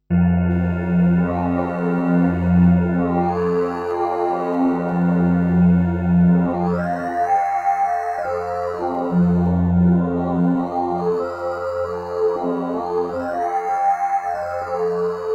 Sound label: ASR-10 Transwaves cyborg